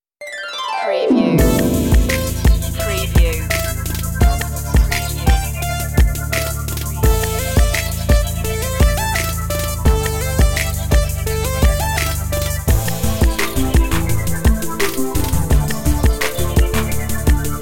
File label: hip hop, Music loop taken from main track; urban rnb